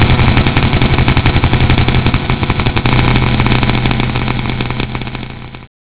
perc; gravel

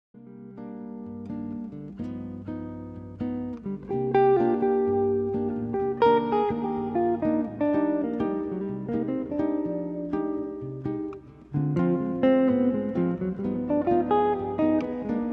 bossa hifi

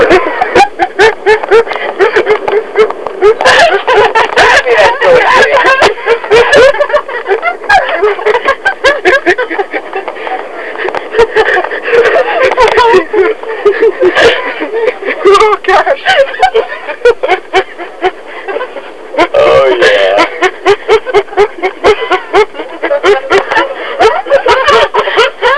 laughing

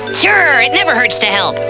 eek

